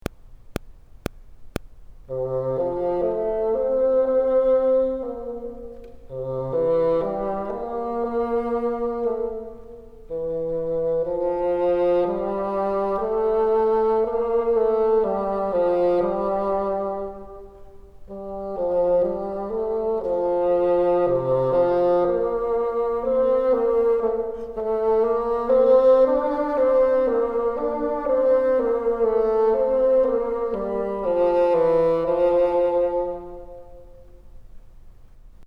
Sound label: exercise top, bassoon